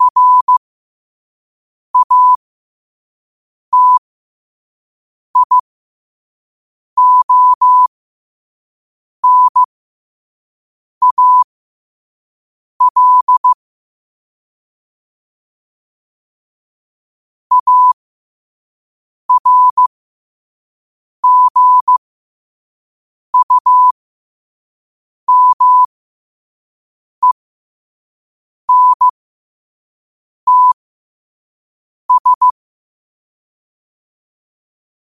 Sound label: 5 wpm, morse; cw; citas